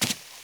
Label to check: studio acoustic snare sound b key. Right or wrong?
Wrong